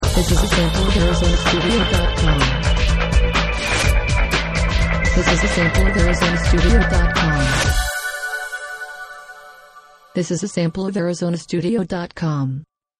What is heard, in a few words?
meditation